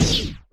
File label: Blaster Bolt Shoot